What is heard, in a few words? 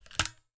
harris bipod down, weapons; accessories; garrysmod